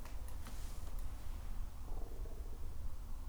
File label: ricks stomach